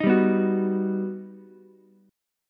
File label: G Augmented Major 7th, Ukulele; G+M7 strummed; JGuitar